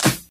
short hand clap sound c key, Royality free clap tuned to the C note. Loudest frequency: 4483Hz; single hits one shots